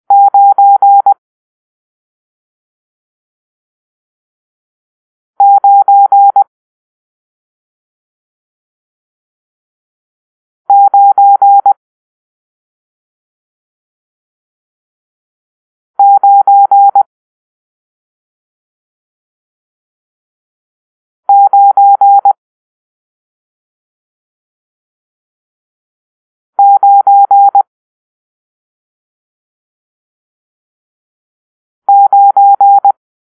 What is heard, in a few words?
Numeral Nine (9), morse code